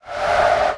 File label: squeak start